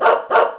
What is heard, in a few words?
2 barks; Puppy